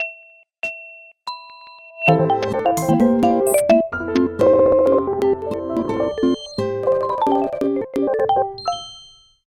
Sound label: soundlogo